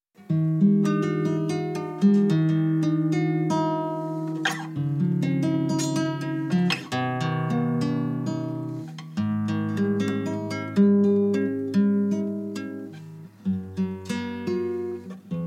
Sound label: Bossa Nova